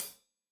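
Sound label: hat closed, hi-hat cymbals stereo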